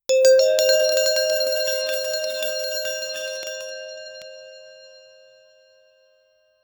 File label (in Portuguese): faz um som de prmio; musical